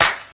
clap one shot a sharp key, Royality free hand clap sample tuned to the A# note. Loudest frequency: 2364Hz; single hits one shots